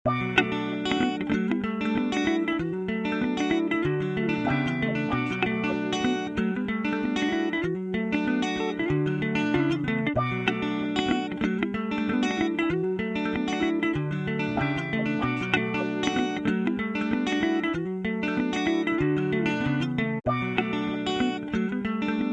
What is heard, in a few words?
guitar mix